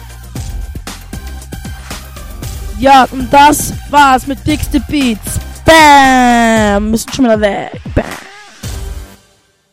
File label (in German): Radio Jingle